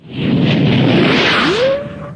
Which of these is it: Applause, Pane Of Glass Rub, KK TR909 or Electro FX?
Electro FX